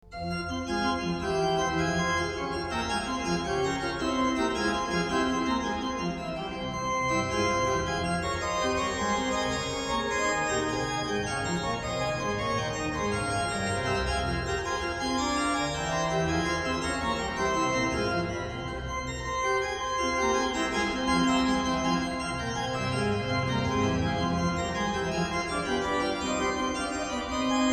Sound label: partita vi, Great Organ Works; Bach; Great Organ Works 3